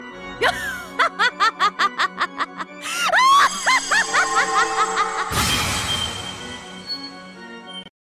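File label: Is Calling R&B, ringtones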